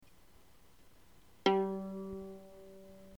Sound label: lowstring